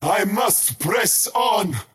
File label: skywrath crown move pain; skywrath mage